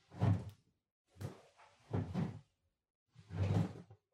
Washer Front Load Far Clothes Take Out

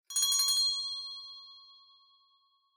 Winning Bell Strong

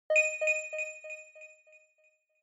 Inspiration Low Delay; Onoma

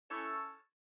OS Sound (Speaker-Notification)

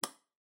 Electric Fan (Switch)

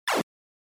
NES General Noise

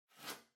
Cardboard Box Far Label Peel Off; ダンボール; 遠い ラベル 剥がす; 梱包材

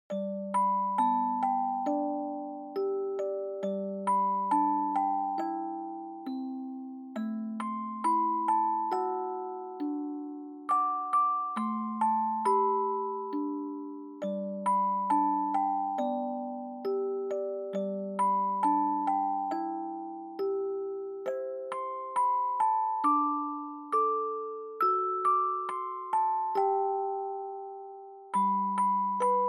Dry Slow; bgm; Jingle Bells

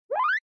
Motion Slide Low; スライド09-3(低)

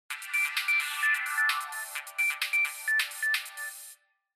Feature Phone Alarm

